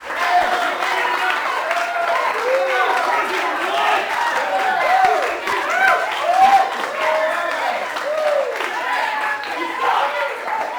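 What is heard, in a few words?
Boxing Crowd Small Cheer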